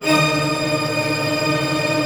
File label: STR TRM A, STRING TREM; Sound On Sound - Peter Siedleczek's Orchestra